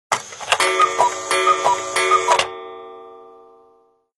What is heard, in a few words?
Clock alert, Nokia Alert tones; 7230